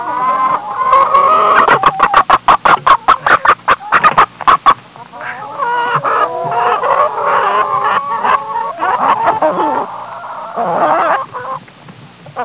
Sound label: Farm hens loud clucking